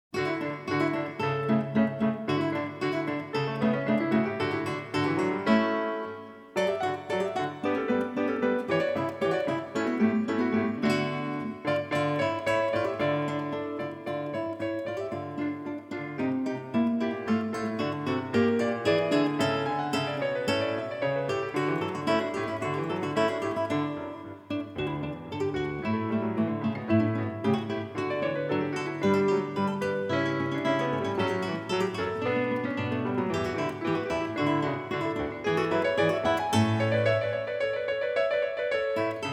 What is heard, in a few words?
Allegro Concerto in D; weddings